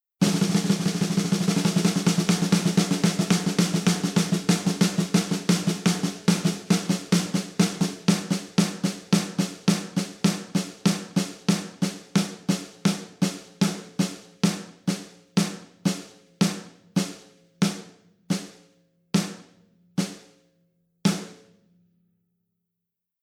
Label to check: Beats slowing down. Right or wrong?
Right